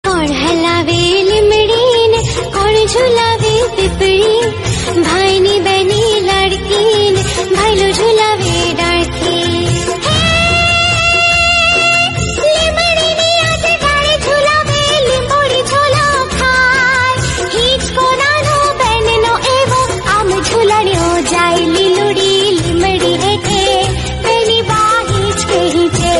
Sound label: kon halave limdi instrumental